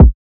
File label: Kick Barbed Wire (B); Drum One Shots